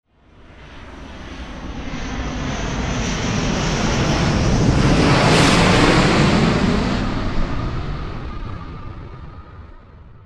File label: flight